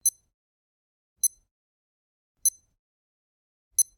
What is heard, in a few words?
Generic Click Digital